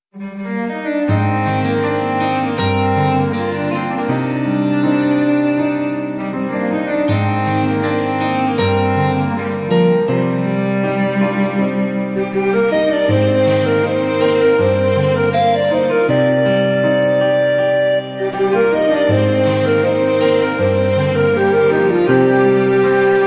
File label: Song Instrumental Ringtone